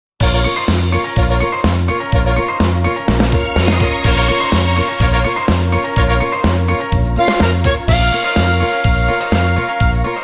minus alto; Bm

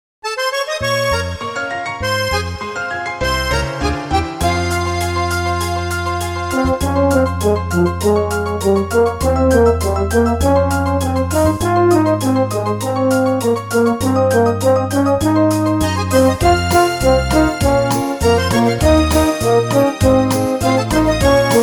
plus fm alto

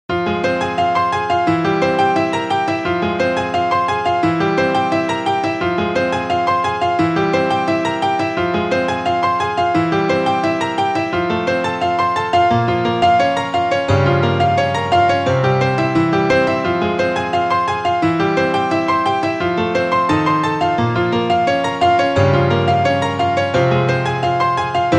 Fm alto; minus

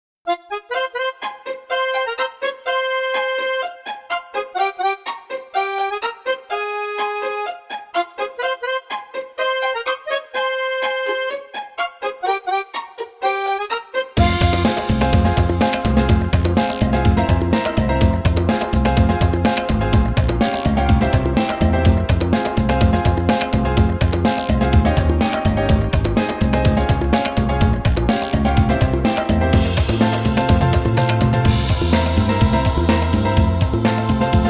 Fm alto; minus

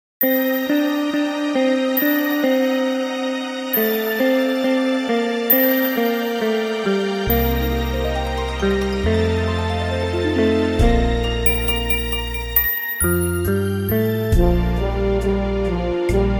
plus b dur alto

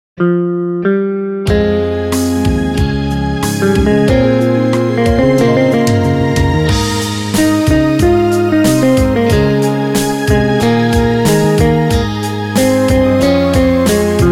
Ab dur alto

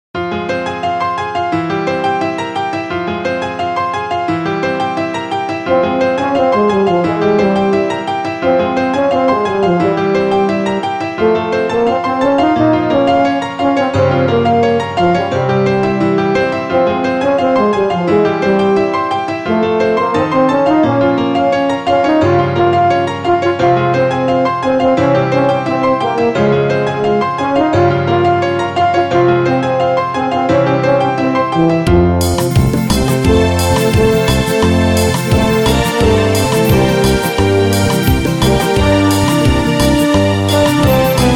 plus fm alto

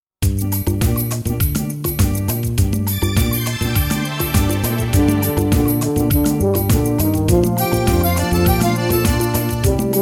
plus gm alto

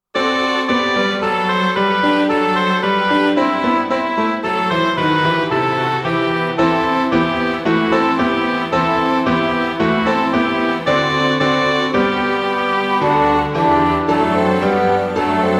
rus song trombone plus; album in trombone

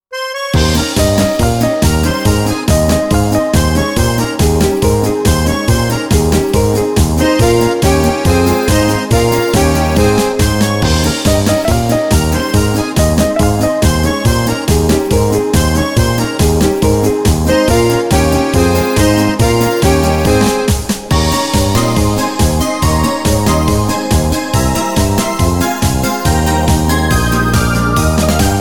minus ab dur alto

